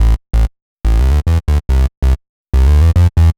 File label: Szeet Bass A, uk garage, 142bpm, Bass; Lines n Loops